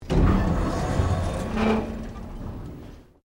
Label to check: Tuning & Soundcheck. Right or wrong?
Wrong